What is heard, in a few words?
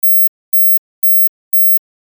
17 kHz